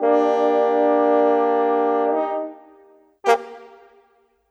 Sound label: Brass; Sonic Foundry (Sony Creative Software); Orchestral VOL-2